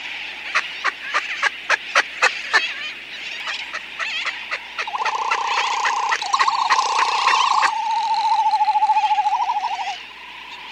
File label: Great Frigatebird